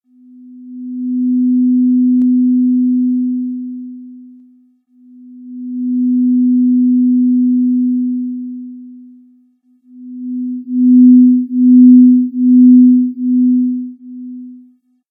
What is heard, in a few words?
Soundcheck